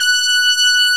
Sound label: SYMPHONIC BRS